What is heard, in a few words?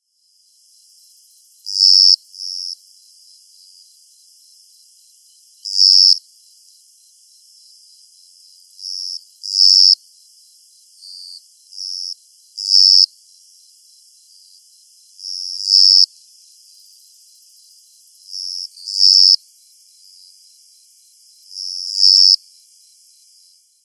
kogata koorogi